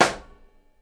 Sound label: SNARE, Live Snares